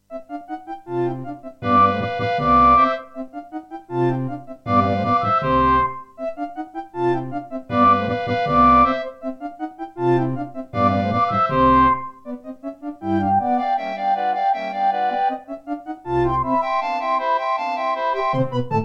Concerto partial, Serdaco McFly; classical; Mozart; Concerto K467 partial

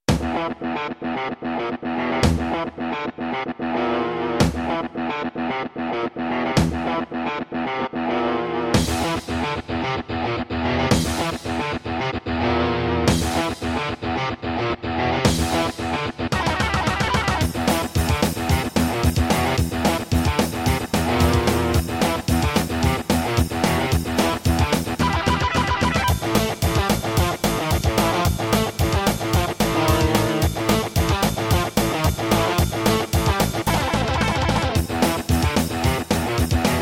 Roland Edirol SD-20 GSMODE; Doom

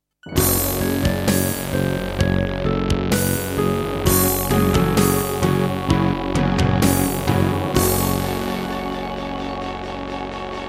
Doom (Sigil) - Introduction [IBM Aptiva SW1C]

